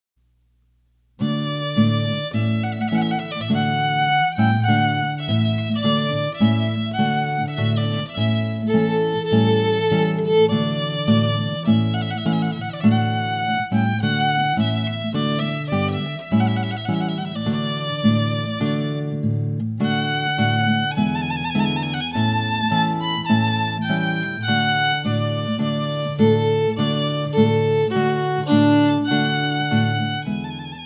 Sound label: Trumpet Voluntary